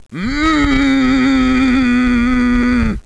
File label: grr